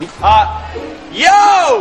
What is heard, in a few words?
scream; HSM3